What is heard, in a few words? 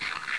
paper